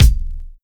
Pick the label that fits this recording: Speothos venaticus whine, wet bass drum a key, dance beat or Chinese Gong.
wet bass drum a key